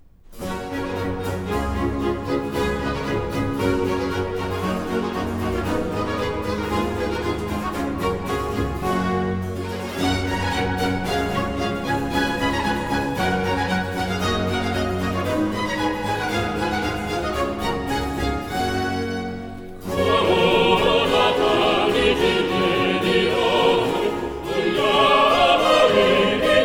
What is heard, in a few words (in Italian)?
Coro Finale; Antonio Vivaldi (1678 - 1741); Vivaldi - Farnace (2009); Atto III; Scena Ultima